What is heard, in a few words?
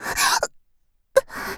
die; Eternal Return; Tia; Voice Line; KOR